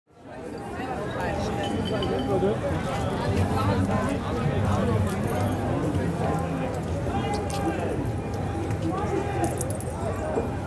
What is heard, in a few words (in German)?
hinterm Wasserwerfer